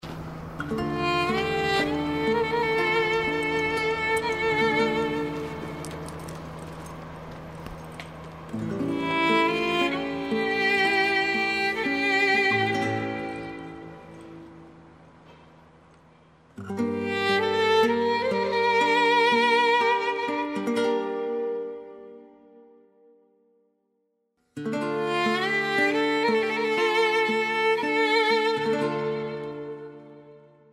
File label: Instrumental Music Asheghaneh